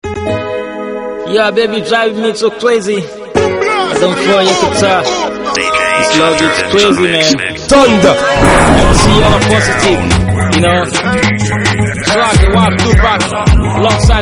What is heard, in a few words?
Afro Banger Mix